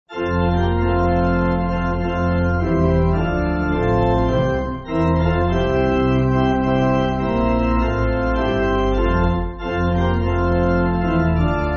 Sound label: Pipe Organ, Music Backing Files for Churches; Gods People Will Meet Him; Sion; Sion énekei II